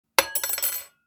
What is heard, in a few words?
Kitchen utensils drop clatter rummage